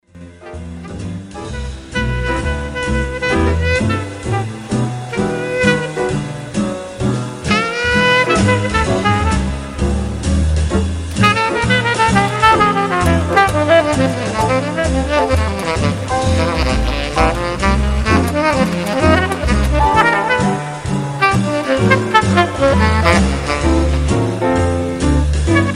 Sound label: SAX en LIVE BAND